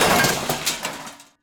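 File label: metal debris bin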